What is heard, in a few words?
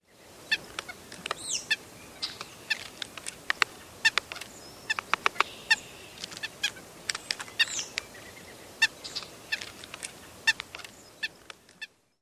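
ik and ik-up calls and tapping, White-breasted Nuthatch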